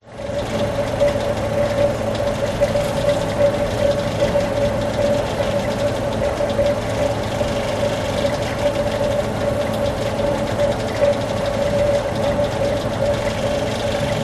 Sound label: public places air conditioning unit rattle close few voices outside lisbon portugal